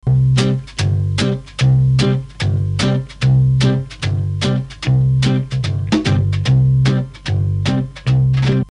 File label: medium foxtrot